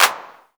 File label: CLAP, Pure Electro, DRUMS; USB Soundscan vol.03 - Pure Electro; AKAI